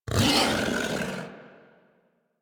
Feral Vocal Angry Attack Charge; Enemies; Kubrow; Warframe

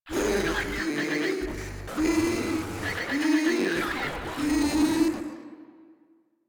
Proto Infested Idle, Enemies; Warframe; InfestedNinetyNine

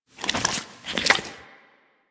Foley Idle Alert Lick; Enemies; Kubrow; Warframe

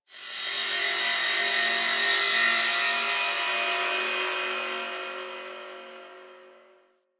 Moon Texture Medium, Ambience; Warframe; Orokin

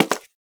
Human Loid Foley Step; Warframe

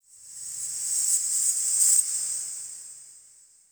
Bell Jingle High, Ambience Crypt; One Offs; Champion Crypt

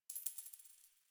Coin Drop

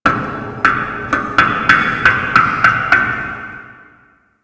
Ghost Cargo Knocks; Ambience; Props; Warframe; The New War; Zariman; Quests

